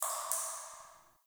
Cave Ocean Drip; Ambience; Midrath Island

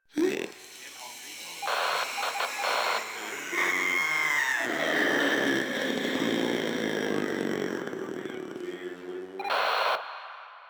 Proto Infested Runner Idle; Warframe

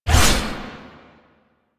Blade Swipe; Melee; Dagath

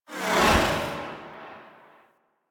Whoosh; Warframe; Yareli Quest

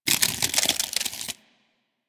Armadillo Foley Scales Roll; Warframe; Hunting Critters

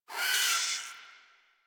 Forest Rodent Vocal Death; Characters; Warframe

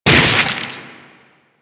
Arm Attack Hit; Enemies; Warframe; Infested; InfestedNinetyNine

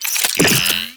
Reload; Warframe; Akarius Prime

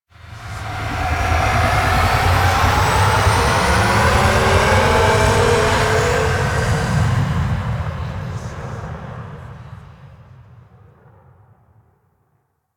Liset Level Undocking, Vehicles; Stalker Liset Skin; Flight

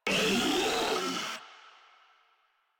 Infested Ancient Player Spotted; Warframe; Proto Infested

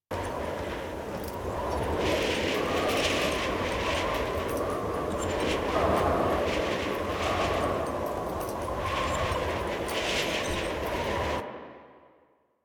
Horse Glide Wind Slow Loop; Vehicles; Duviri

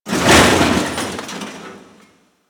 Shipyards Metal Debris; Warframe; Grineer Shipyards

